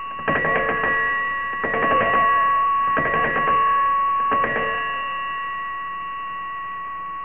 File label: engine order